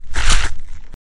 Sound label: ninja samurai sword